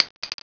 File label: shell; blockar1